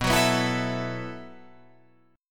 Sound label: B 7th Suspended 4th B down Guitar Open F; B7sus4; strummed